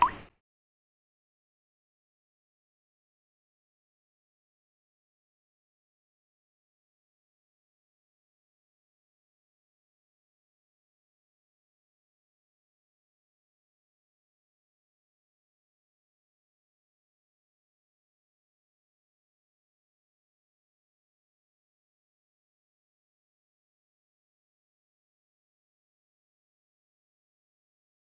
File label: drip; pranks; realtor